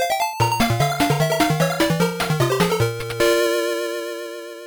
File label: Success; lemmings master system